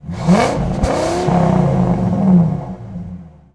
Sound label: Truck Ford250 Rev; Trucks